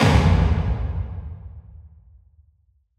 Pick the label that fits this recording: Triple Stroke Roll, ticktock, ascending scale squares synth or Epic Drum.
Epic Drum